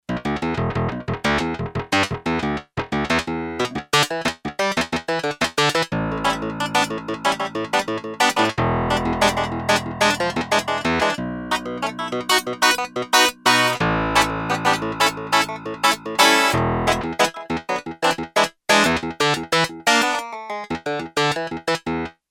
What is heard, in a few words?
Clavi; Yamaha TX802; Factory Patches; Voices